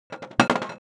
drop right shuffled, large glass compact mixingbowl; AnalogousNonSpeech